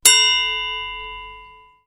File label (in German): triangel; instrumente